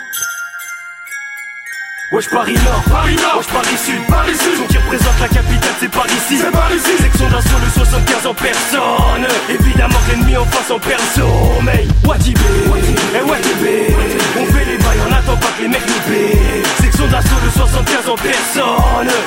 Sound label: rap